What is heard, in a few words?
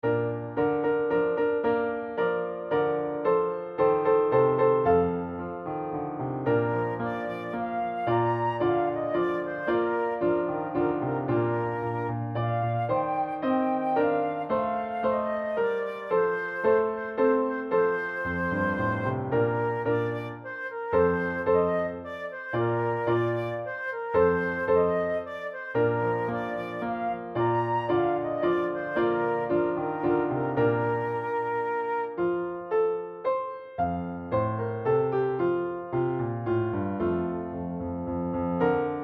menuett practice, band